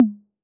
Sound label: Tom Hi C 808, Clean Digital